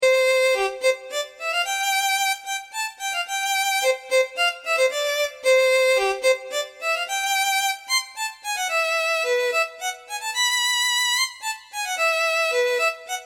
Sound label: violin